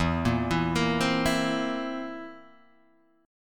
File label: A sharp Suspended 2nd Flat 5th